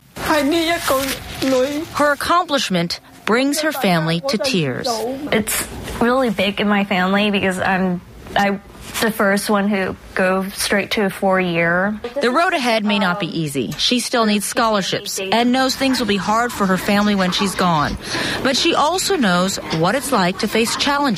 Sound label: crying girl